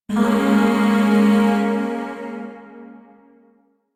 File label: Shawzin Phrygian Chord L; Music; Chords; Warframe; Zariman Void Shawzin; DE